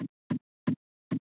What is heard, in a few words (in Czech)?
nervos; Paulie; Mafie 1 cz